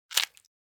Wet Pineapple Break

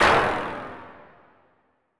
Cold Thud

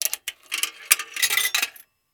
Coin In Jukebox Slot Fall

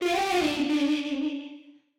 Vocal Distorted Vocal Sample; Lollievox

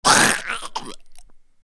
Tongue Spit

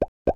Toony Pops

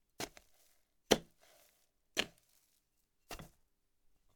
Digging Dirt

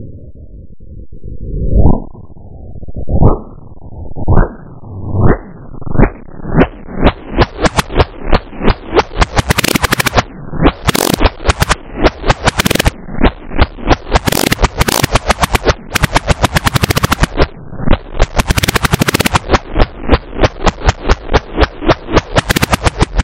Windup Whip

